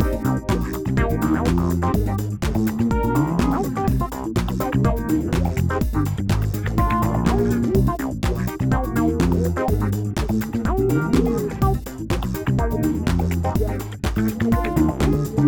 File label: Old School Break, Drums